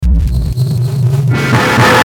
Feedback Delay Low Buzz, Feedback High Pitch Distortion Static; Feedback Sound Effects